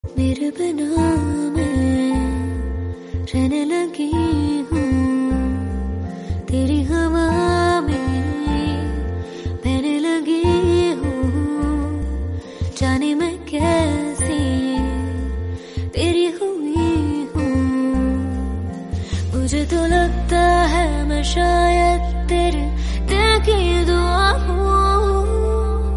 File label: female version